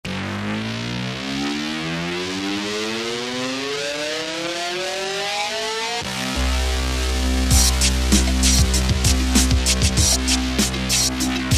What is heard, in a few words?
Juno60